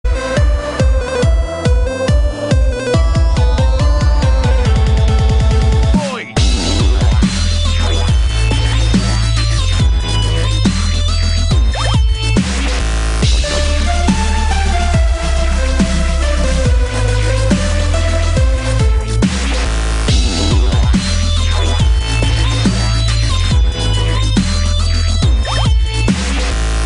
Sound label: Dubstep; Tetris